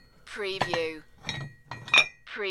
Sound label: Weights